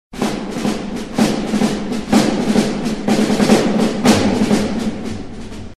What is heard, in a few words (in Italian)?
military drum, suoneria; allarmi